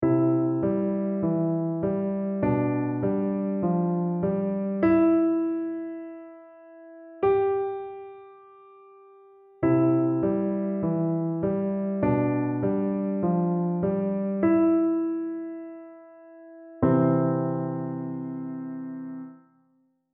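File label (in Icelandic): vögguvísa alberti bassi, píanó; piano leikur eldri nem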